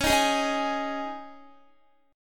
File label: Db Suspended 2nd, Guitar; strummed; x,16,13,13,15,15